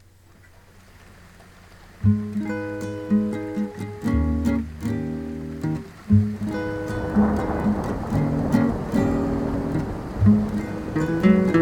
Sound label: rough tracking mix